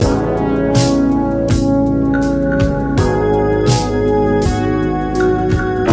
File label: Chop